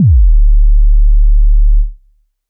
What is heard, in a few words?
Bass Sub; Routed Sound; Sylenth1 Expansion; Chaos Bringer Vol.1